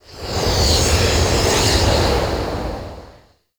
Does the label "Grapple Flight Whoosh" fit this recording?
Yes